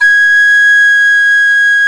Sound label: SOP G#4, MOECK; SoundBytes - Early Patches